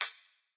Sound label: Bullet Bounce